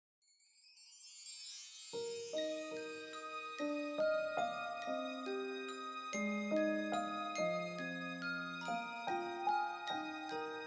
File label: tutti